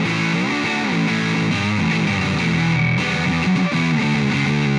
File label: Pushing Riff, Rock Guitars